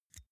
insert xlr extension quick; objekte; elektronik

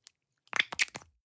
gel dispenser squish air release, badezimmer; haus garten

